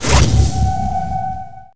catalyst, items

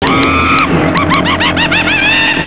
laugh

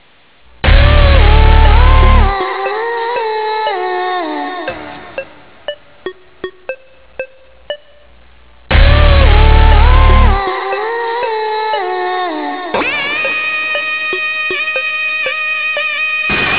spitfire, ambience; tfc; nuklear

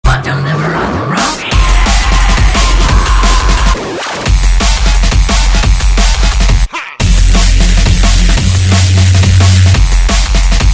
win music

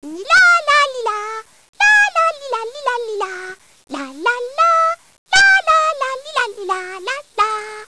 singing; svencoop; teletubbies; laalaa

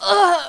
pain, male

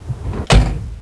trunk slam

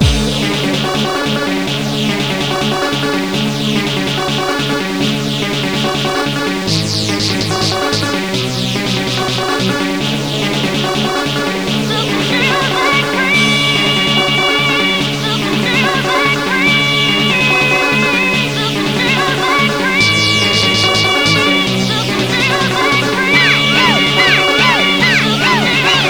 music; tfc

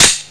mp5 handle back; firearms

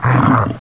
shock trooper pain; svencoop

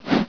kick; gearbox; demoman

